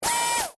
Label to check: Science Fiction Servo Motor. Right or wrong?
Right